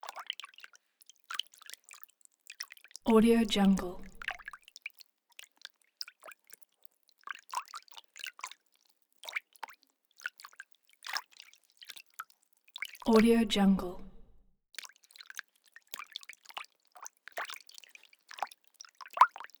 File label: water hands small agitation; soundmorph